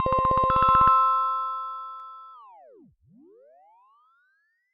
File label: Trippy Phone Tone